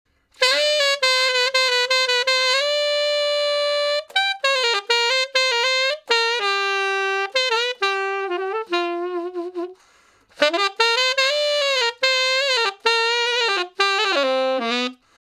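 Sax Slow Blues (G V, IV, I, V) pickup 1 beat, Tenor Sax; Ten Sax Slo Blus (G); R&B; Solos; Crimson Blue and Fabulous Horncraft 4 RnB; Horncraft for R&B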